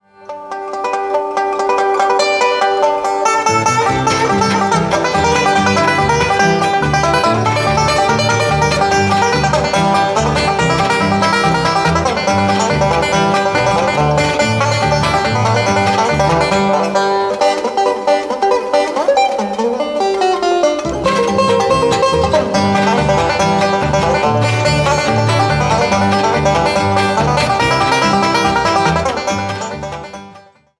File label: blu glass banjo